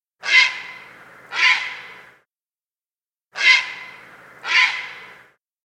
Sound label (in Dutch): vogel